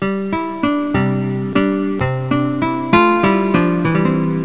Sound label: Soft Melody; psion cd vol1